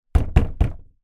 household door internal wooden hollow knock hard

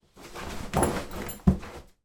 foley kicking off trousers bathroom

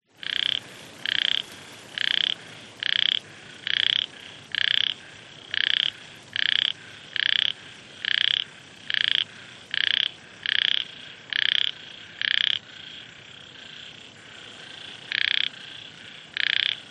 frogs wind vietnam mono

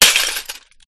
Glass Shards Smash Impact Bright; Skyes Audio

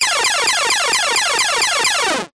game retro laser repeat level complete

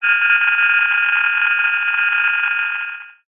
technology cb radio interference burst receiver; zapsplat

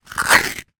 toilet roll card centre rip; foley; zapsplat

